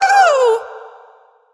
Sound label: Wound Crit; Julianne